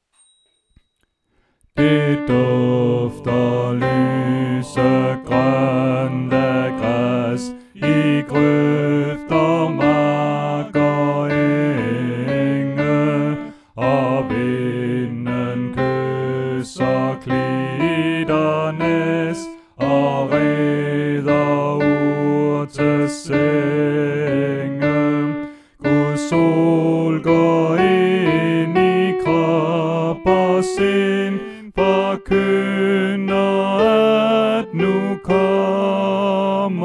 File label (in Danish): Sommersalme Alt1; oevefiler